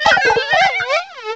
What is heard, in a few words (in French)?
Cri; DP